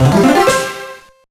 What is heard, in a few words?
Pick-Up; Santa Claus Junior; GBA